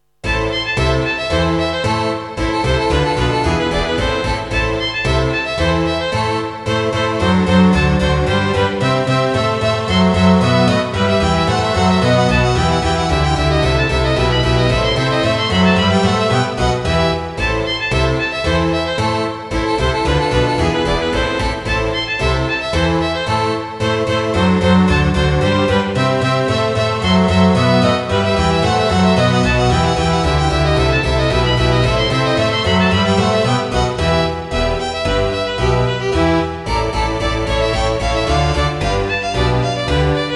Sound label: orch; badinerie bach